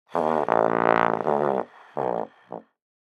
Baritone Sputter, Baritone Sputter Struggle Putter Out; Cartoon Music; Blastwave